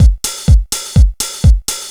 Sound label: HOUSE BEATS 125